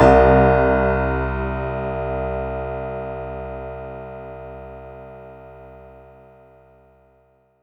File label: C2, Synth Line